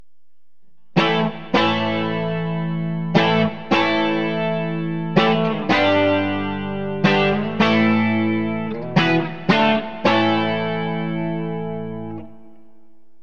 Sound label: bridge clean; burny